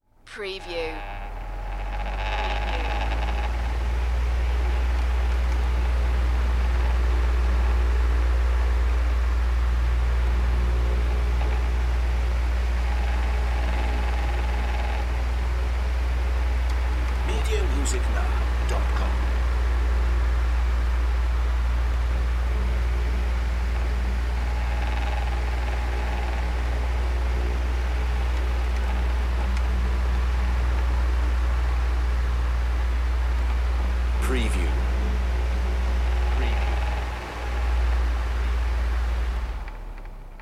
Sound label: room fan cycle